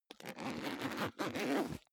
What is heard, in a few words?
zipper backpack open unstable